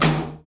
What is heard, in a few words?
TOM TOM; 90 s Sample CDs; Sound & Vision - Gigapack I CD 1 (Roland); Real-Kit s10